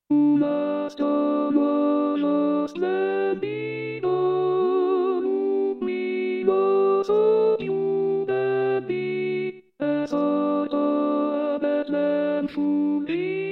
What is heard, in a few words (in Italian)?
Contralti; natale